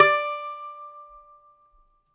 DOMRA, Prima Domra, Plucked Strings; E-MU Producer Series Vol. 7 – Old World Instruments

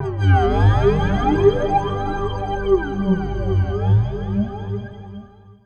Guitar Textures

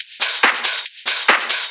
Loops 140 BPM Hihat Percussion Loops

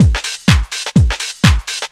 brownbag, Deep House 125bpm

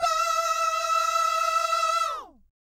VIB FX, Jazz FX; Spectrasonics; Vocal Planet; JAZZCOMBO

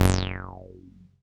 505, String Machines

